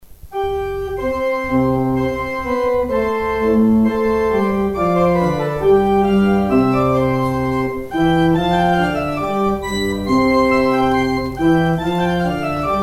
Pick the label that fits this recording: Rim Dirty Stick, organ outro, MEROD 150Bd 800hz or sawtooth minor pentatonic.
organ outro